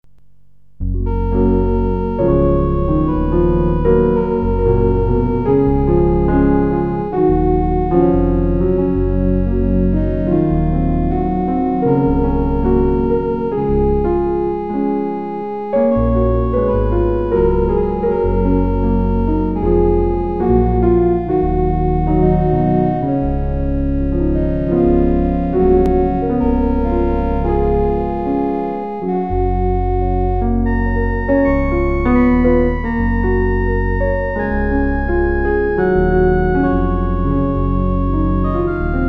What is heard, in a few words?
The King of Love, Piano Organ